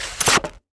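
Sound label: crossbow AWAY; The Elder Scrolls III - Morrowind; Morrowind GOTY GOG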